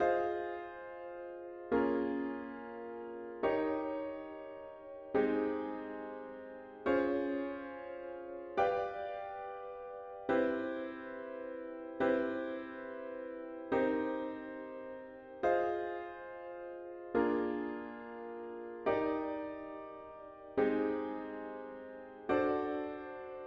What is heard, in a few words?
chords only